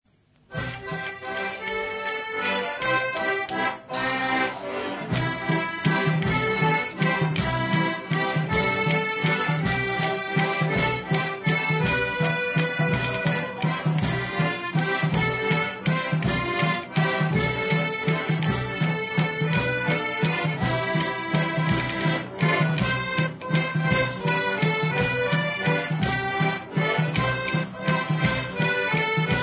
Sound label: waltz, folk